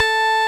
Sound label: Roxichord A 4, RMI E.Piano; Keyboards of The 60's and 70's; Roxichord 1